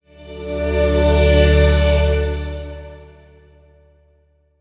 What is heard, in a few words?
Teeter Game Complete